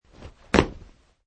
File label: Landing Wood